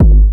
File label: deep techno; TECHNO RAVE; Pro-Rec - Synthsonic